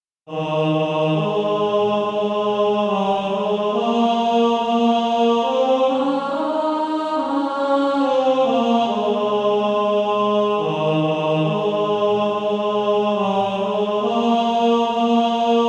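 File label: Male Choir, tenor 2; Infant Holy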